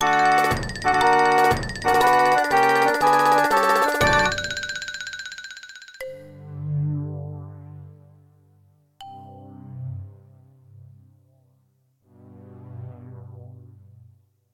win; Pikmin2 FF

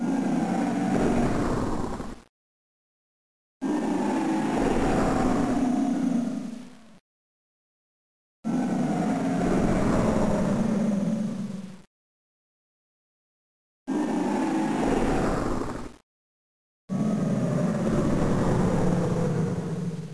weird breathing; Mother 3

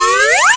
camera sfx unused

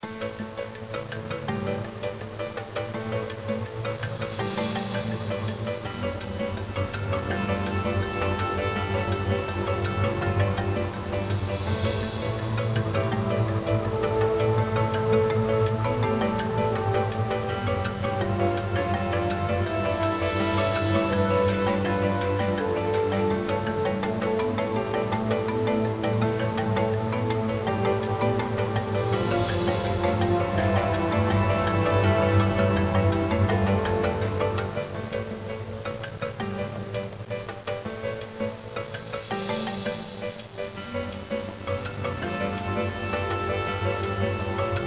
Unused Music; Putt Race

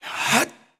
male skill; Aion

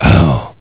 PAIN; Duken3d

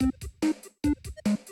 test bgm

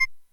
Talk

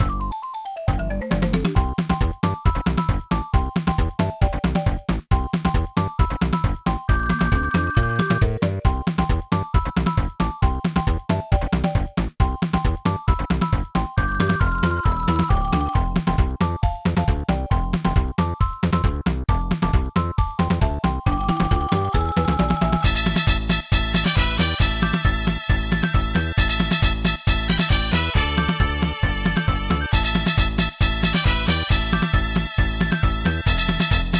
unused song; Shanghai

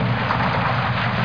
NOISY WATER; Frogger 1997

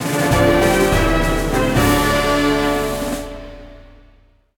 upgrade found; Bionic Commando 2009 PC

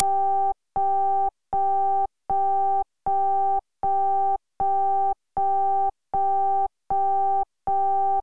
Sound Module Burn-in Test; SNES Burn-in Test Cart

